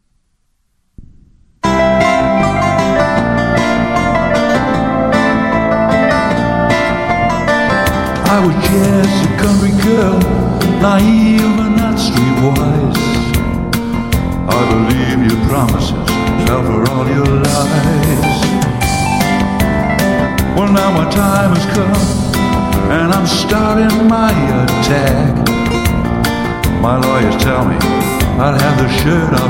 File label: country